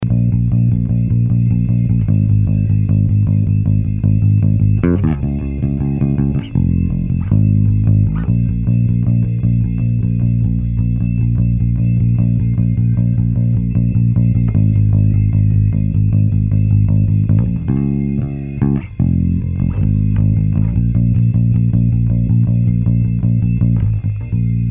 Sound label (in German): bass pfeifen